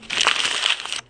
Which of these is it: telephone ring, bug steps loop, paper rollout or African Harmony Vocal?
paper rollout